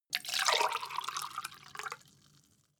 glass pour fast; soundpool

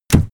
car doors

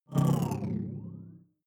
sci-fi alien big bionics down; swooshes-swishes

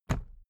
briefcase plastic put down; industrie wirtschaft; bueroarbeit

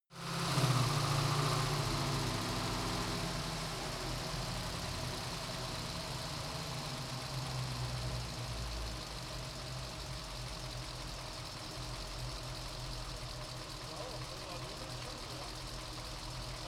car camaro slow down engine

